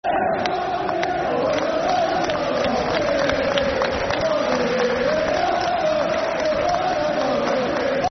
When The Blades Go Marching, Football Chant, Soccer Song; Sheffield Utd Sufc